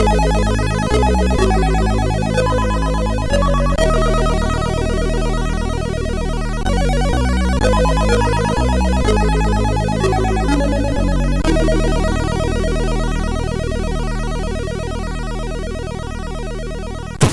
intro, sid MOS6581R4; hvsc

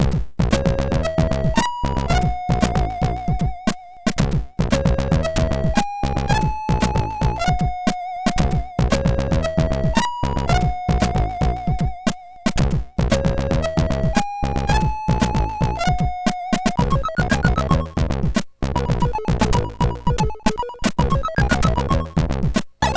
Intro MOS6581R3; Style Intro; hvsc

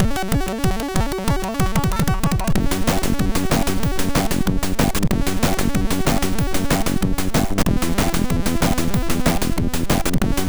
sid, MOS6581; MOS6581R4

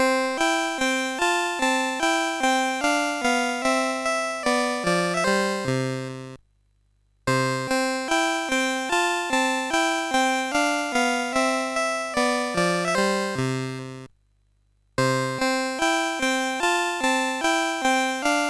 Buggy, MOS6581R2

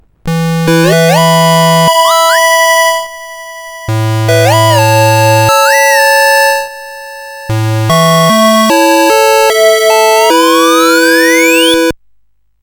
Musicbox, sid CSG8580R5

